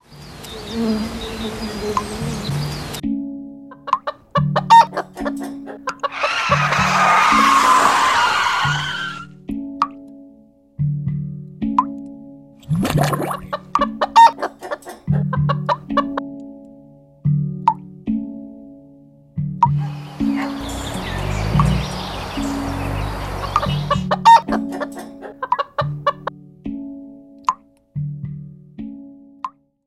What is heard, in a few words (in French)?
hang